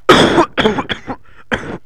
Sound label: cough; Huki